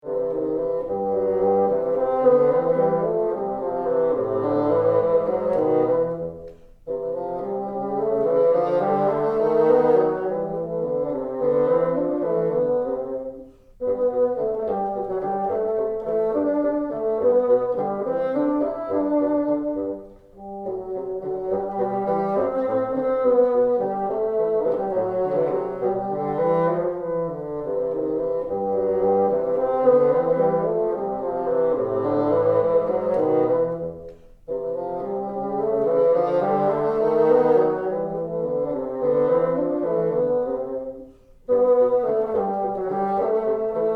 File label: together, bassoon